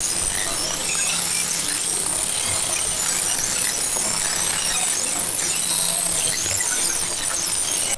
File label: rapid granular synthetic digital buzzing; ui